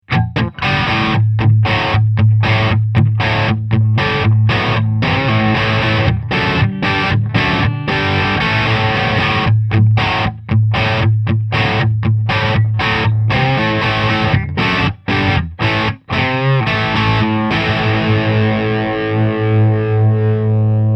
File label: Crunch